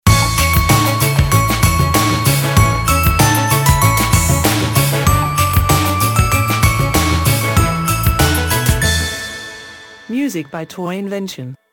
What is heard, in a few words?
Cool Christmas stinger